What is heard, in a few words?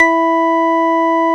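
organ E4; Best Service; Advanced Media Trax Modern Composer; DANCE ORG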